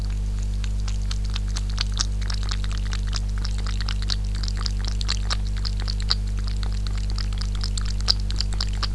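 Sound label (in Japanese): mizunomi, cat drinking water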